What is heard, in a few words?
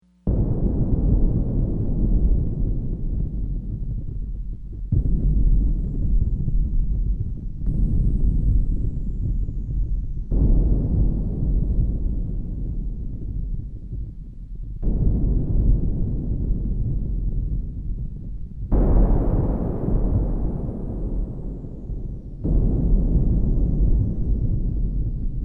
Thunder